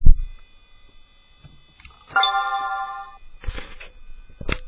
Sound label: Scary MAC noise